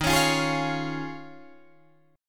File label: Eb 9th Suspended 4th, Guitar, Drop D